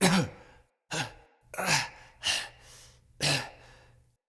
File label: Hallway Transition Vocals Male; Cinematics; Bromius; Envoy